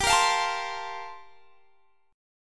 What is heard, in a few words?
G Minor 11th G down; Gm11 strummed